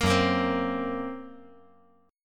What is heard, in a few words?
Gb Major Flat 5th, down